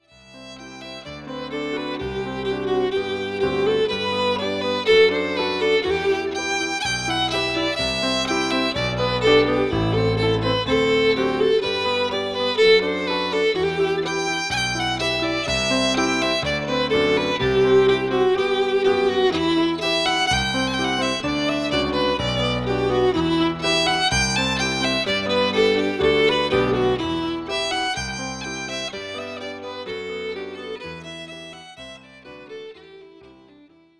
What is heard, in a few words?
Strathspeys